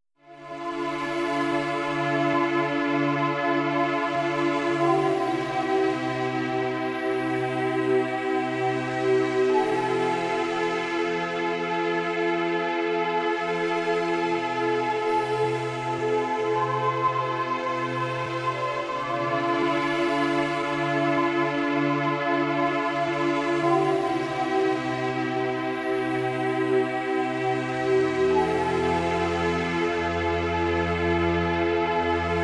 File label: 432 hz